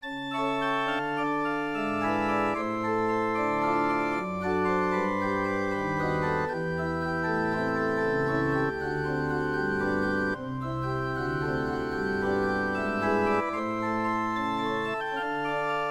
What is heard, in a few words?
Gloria impro